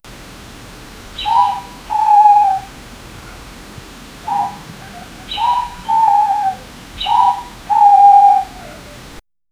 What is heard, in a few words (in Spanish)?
sonido de pajaro muerto; wince